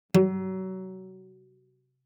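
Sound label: Cello pizz fortissimo sul D Gb3; MIS Pitches - 2014; Strings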